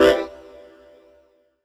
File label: CHORDS; EastWest; Dance Mega Hip Hop Electro